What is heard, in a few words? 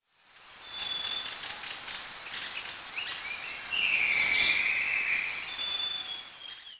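jungle ambience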